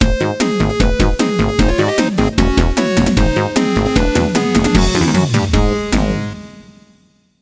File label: Game Over Normal Game; bomberman party edition